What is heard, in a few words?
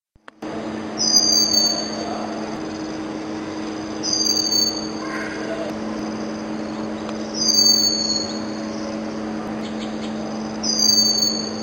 Blue Whistling Thrush adult call; Amar